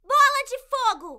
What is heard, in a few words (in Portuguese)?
bola de fogo; grand chase